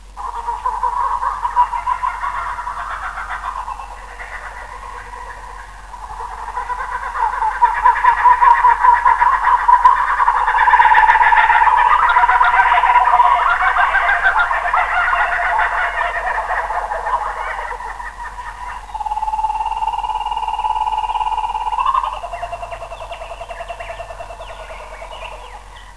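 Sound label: kookaburra; zoo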